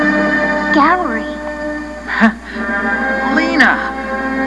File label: kiss